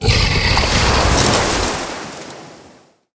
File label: Death Water Elemental